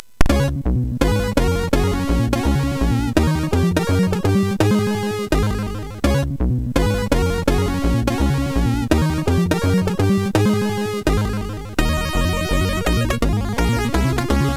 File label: Jazz, MOS6581; Jazz Time; MOS6581R4; hvsc